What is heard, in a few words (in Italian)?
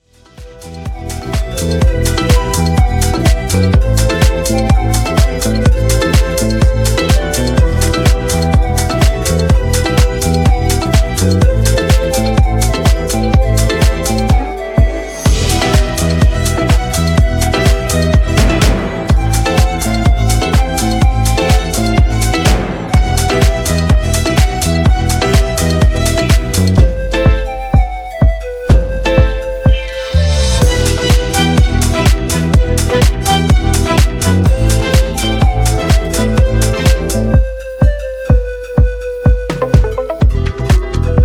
Groove Continuo; Sanremo